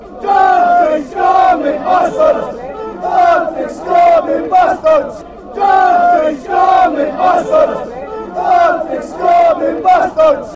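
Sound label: fanchants, football chants; dirty scummers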